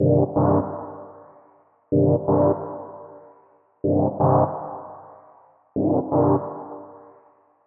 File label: Synth Loop 125 bpm Cmin; Unit Rcrds